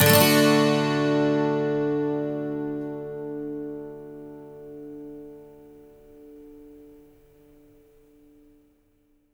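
12 string major D, Electric & Acoustic Guitar Loops; USB Soundscan vol.04; AKAI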